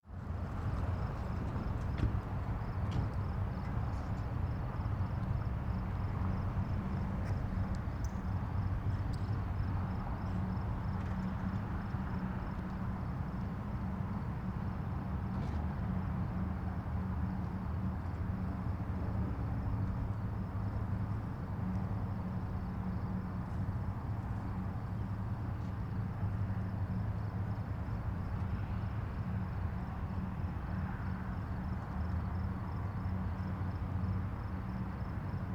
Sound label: morning highway in distance